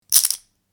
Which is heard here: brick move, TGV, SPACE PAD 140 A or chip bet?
chip bet